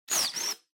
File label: Blowout